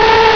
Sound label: skid off road; ROADRASH